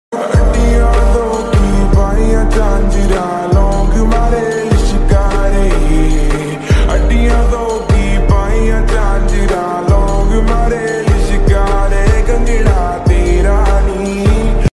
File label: lofi ringtone, love ringtone